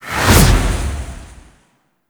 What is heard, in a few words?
Sonic Dissipation Cast; Warframe; Focus; Vazarin